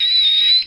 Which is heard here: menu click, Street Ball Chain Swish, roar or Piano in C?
roar